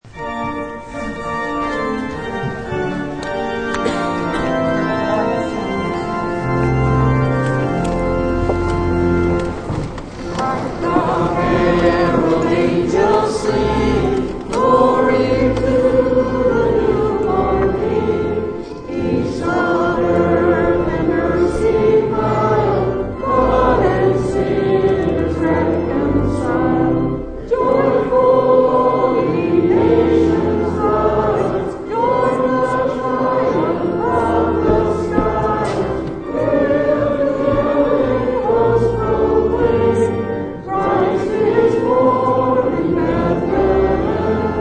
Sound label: Hark the Herald Angels Sing Hymn, Congregation sings Hark the Herald Angels Sing; Churches